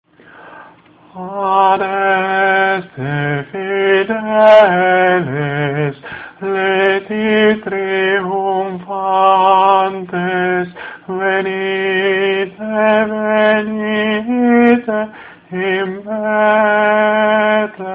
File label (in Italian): adeste fideles tenori, coro